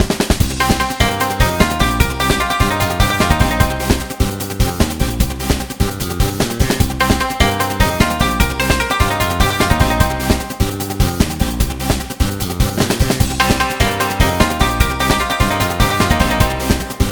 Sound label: bgm dice battle; Unification Chronicle 47 Prefectural; android game music